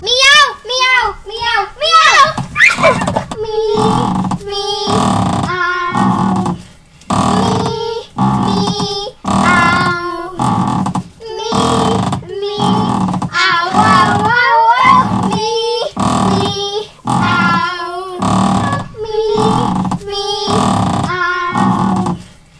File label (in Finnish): leikki